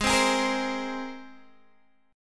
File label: G sharp Major Add 9th, Guitar Open G